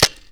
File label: from side, guns; vm-68